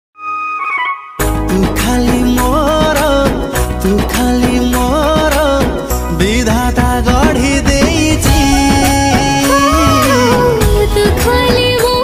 odia song ringtone

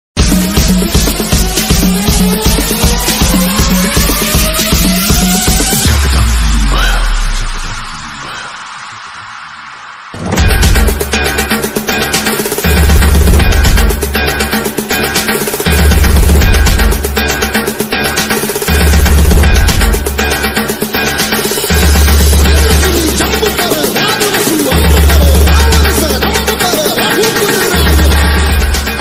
music ringtone; chatrapati shivaji maharaj

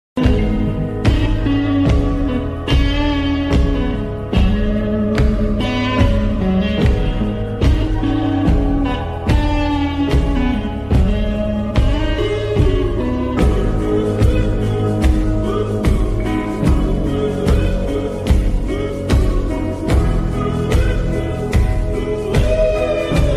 slowed reverb ringtone

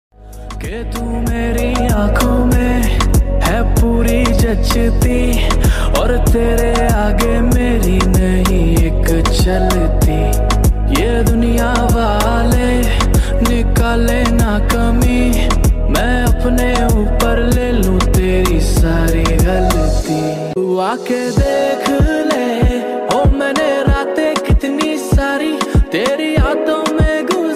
sad ringtone bgm ringtone hindi song

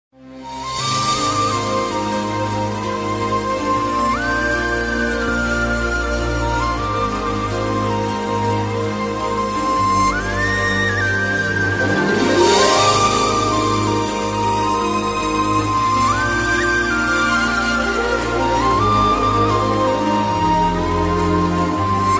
malayalam bgm; devadoothan

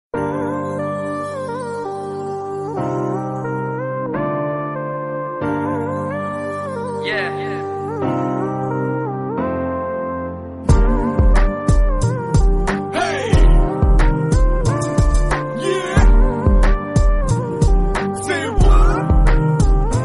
love bgm tamil ringtone

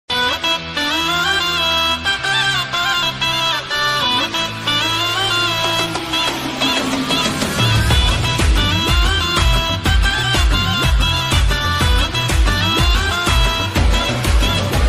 music ringtone; salman khan

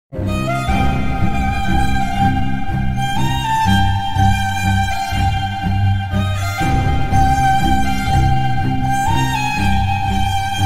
sad bgm tamil; keerthana accident scene; raja rani; nazriya; arya

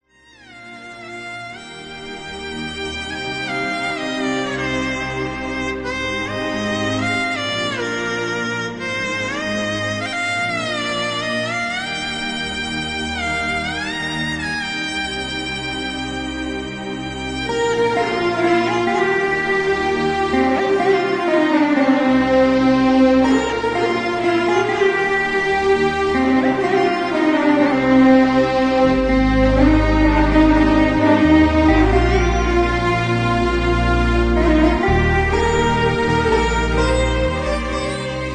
sad bgm music ringtone music

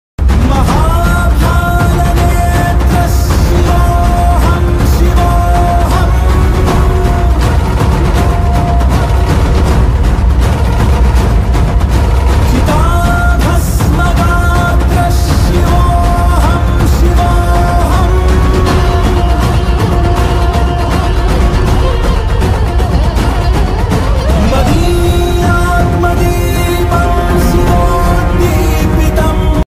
ringtone; adipurush

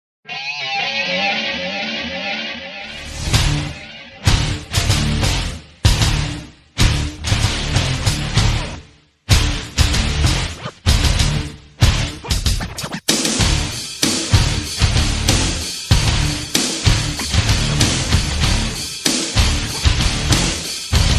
entry music; brock lesnar; wwe

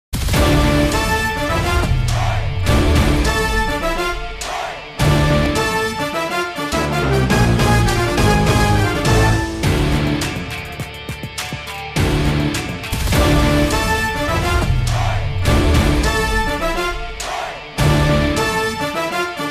bgm ringtone mass bgm; dikkiloona

